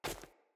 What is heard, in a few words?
Battle Dog Walk Veg, Footsteps; Snc Battle Dog; Foley